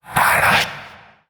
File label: Possessed Red Veil Vocal Attack